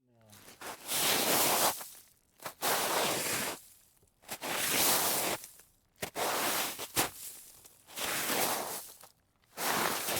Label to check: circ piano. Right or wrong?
Wrong